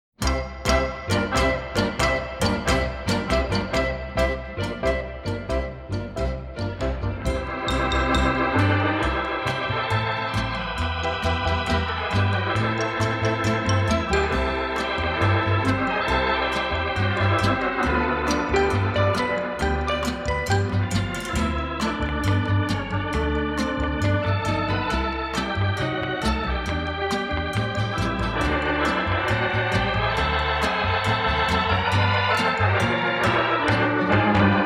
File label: jingle bells bossa nova